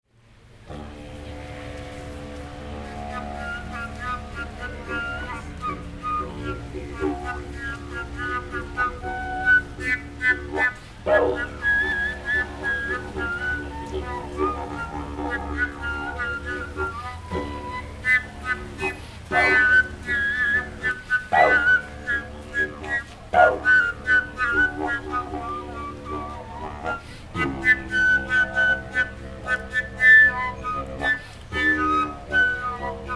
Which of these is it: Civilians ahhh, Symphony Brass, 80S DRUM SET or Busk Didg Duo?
Busk Didg Duo